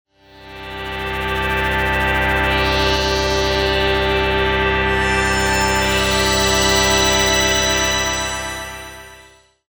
Flutter By Pad; UA Masterclass; Pads

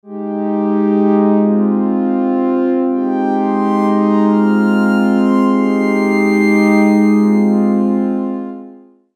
Hybrid Glass Horn Pad; UA Masterclass; Pads

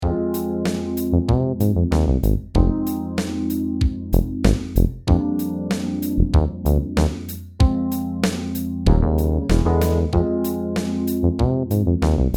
Disco, Funk Disco Basses; UA Theme Packs

